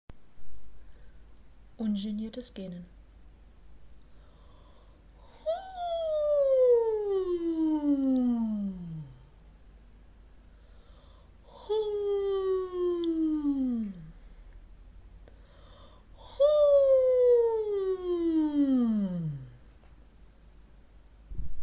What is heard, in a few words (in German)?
Kopfstimme finden Ungeniertes Gaehnen